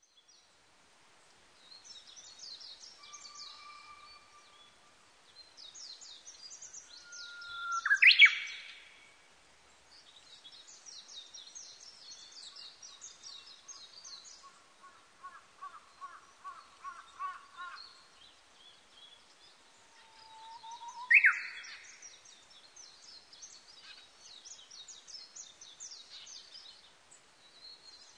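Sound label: Japanese bush warbler; uguisu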